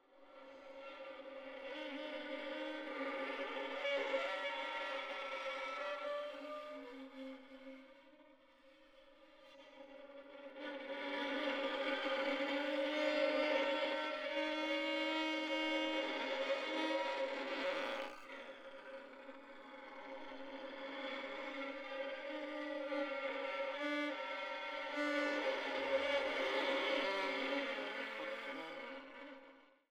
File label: Violin sound; Karin Hellqvist